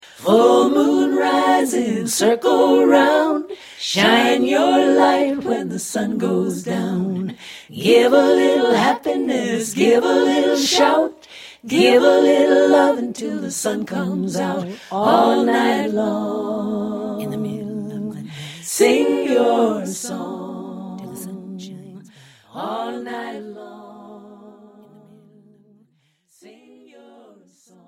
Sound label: natural rhythms